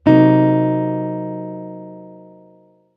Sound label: c min7b5, chord